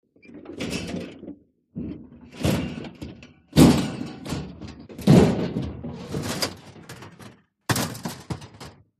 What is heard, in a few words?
Junk Clatter metalli, crashes